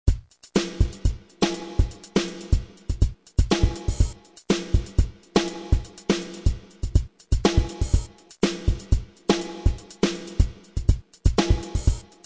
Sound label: drum groove